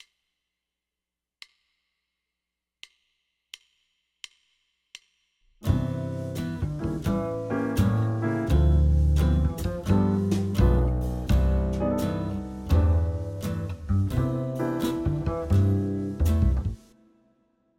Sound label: i vi ii v7 anatole, guitare jazz